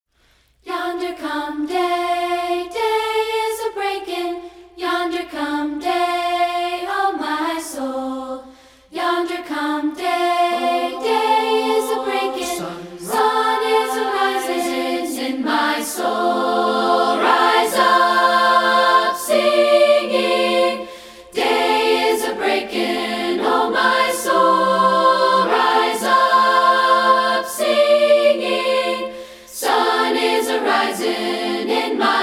A Cappella; Yonder Come Day

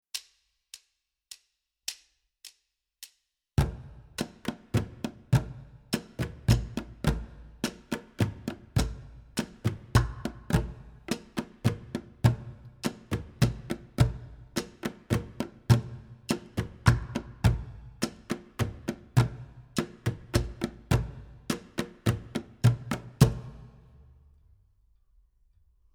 Band Perc; Theres Hole Bucket

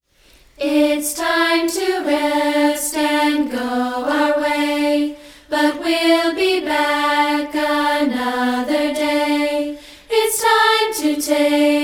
A Cappella

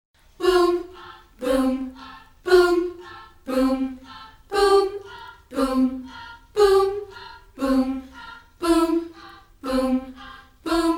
Boompah Booms

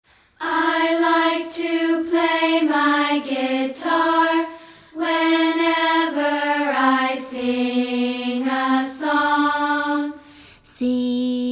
Strumming Singing

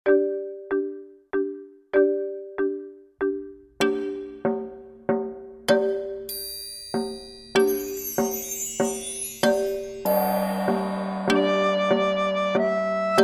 Paper Lanterns Perc Rec

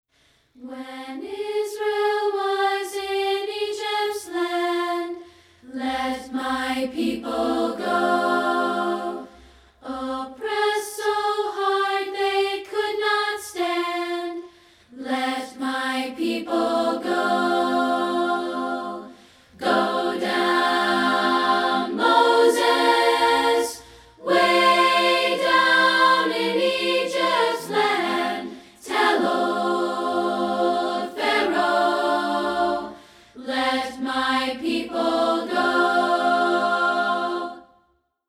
Go Down Moses A Cappella

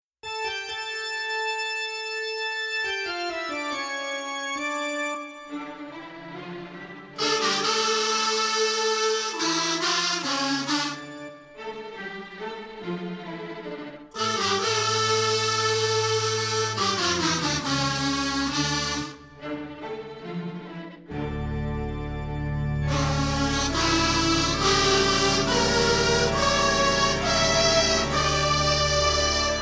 kazoos organ

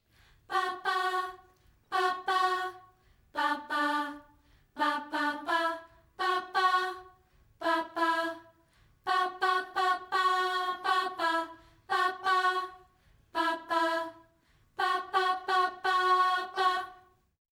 Oh Happy Birthday Upper

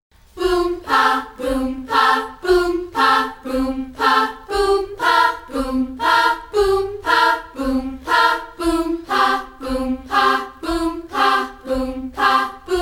Boompah A Cappella

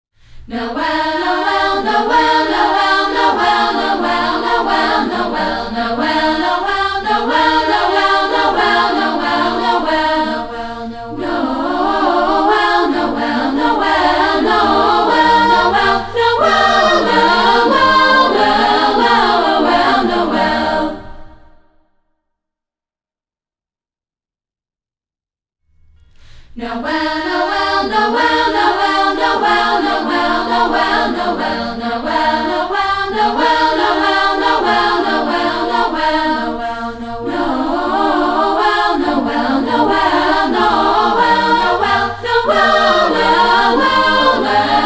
Noel A Cap

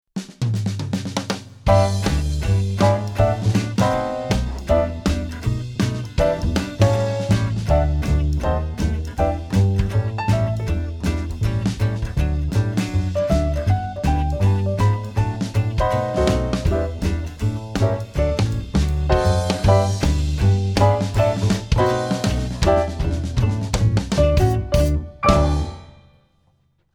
Rhythm Only

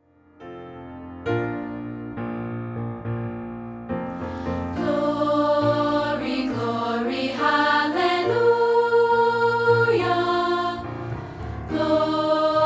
Battle Hymn; Gettysburg

